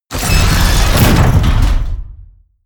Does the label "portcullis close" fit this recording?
Yes